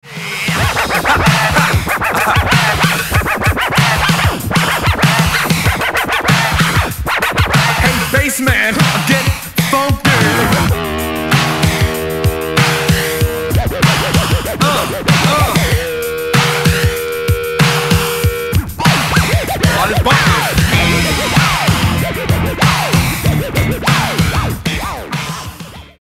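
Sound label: extended instrumental version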